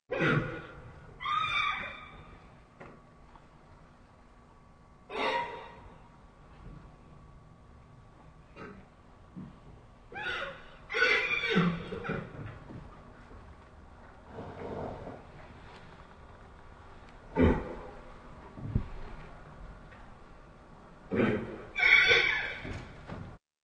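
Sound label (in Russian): лошадь стоит в деннике и издает легкое ржание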